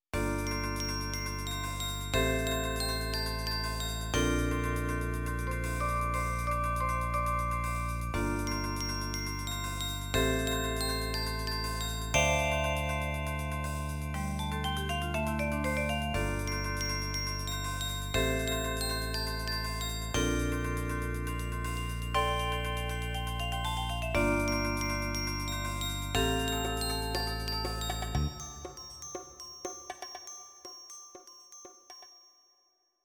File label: Perc Electric Piano and Bass Gtr; Scillatem